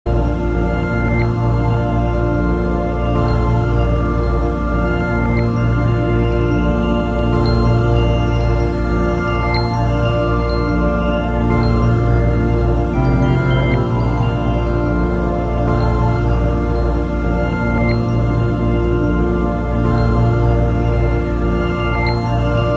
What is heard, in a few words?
pop ambient